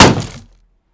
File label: shield light block small